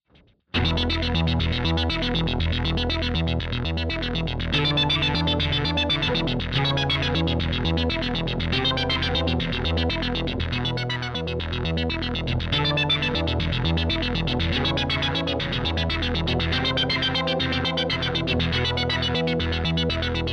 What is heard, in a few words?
arpeggio